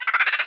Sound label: Macaque Attack Jump; Playable Tutorial Level; TR3